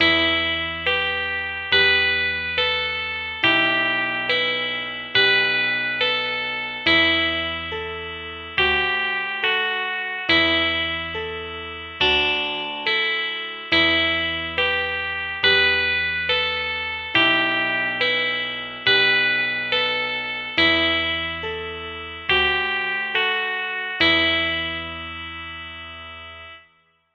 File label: alt intro b dorian